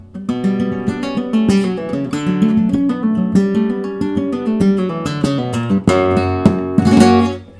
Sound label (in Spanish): falseta